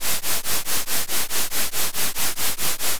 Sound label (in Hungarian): feherzaj modulalva lassan